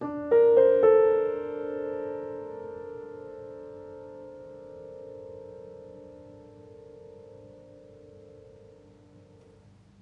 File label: Music Level Fight Stinger; Florence